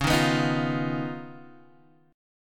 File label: Eb 7th Suspended 2nd Sharp 5th, Db